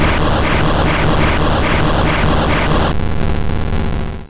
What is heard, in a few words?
Earthquake; Pokemon; Gen 1; attack moves; RBY